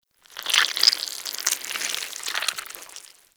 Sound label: Acid Squelch; Ambience; Bromius Inside